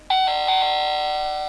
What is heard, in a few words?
toy doorbell; alert